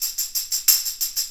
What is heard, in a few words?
Tambourine